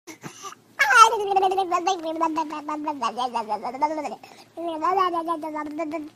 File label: Baby Gargle